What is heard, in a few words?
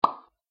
plop